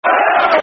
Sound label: Who Are Ya Football Chant - Aston Villa; Aston Villa Avfc